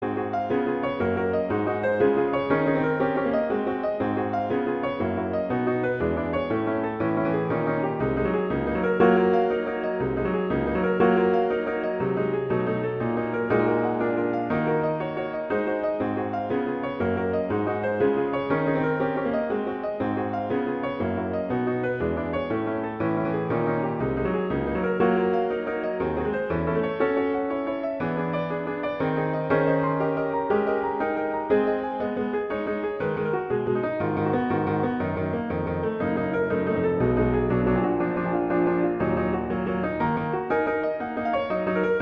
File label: Allegro; Scriabin; Etude